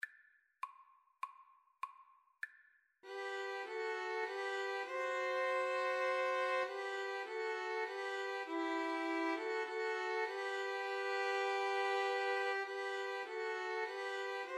be still my soul, 2 Violins Cello

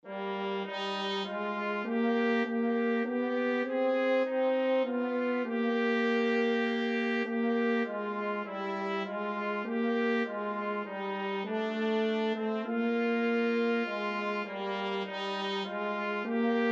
trumpet french horn duet

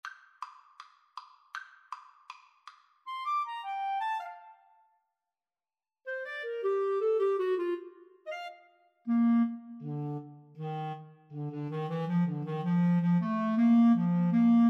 clarinet saxophone duet

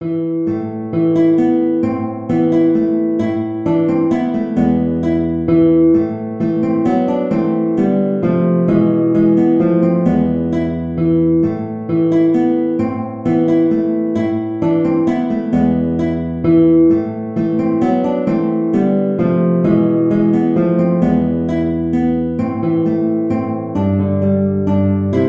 guitar

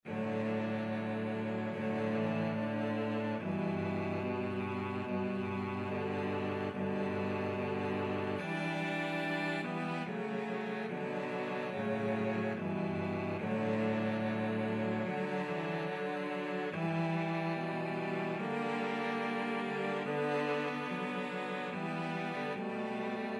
deep river, cello trio

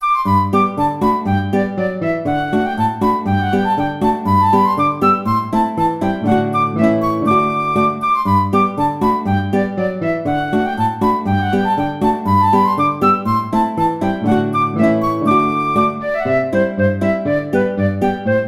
Quick March, flute guitar duet; Mr. Handel

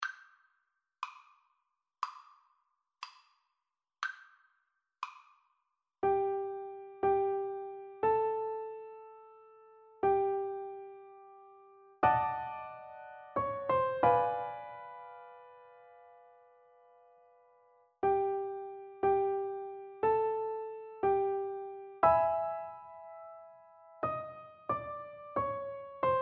happy jazzy birthday, Piano Duet

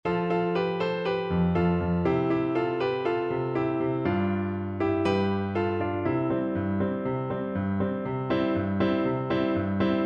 falile se kastelanke FL kar, Flute

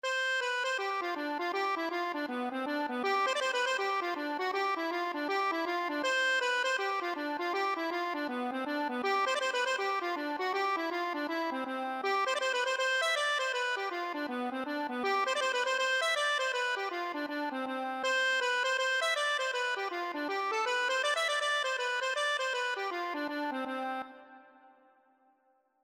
accordion, Irish Trad.

